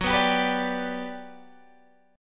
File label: G Major F sharp, chord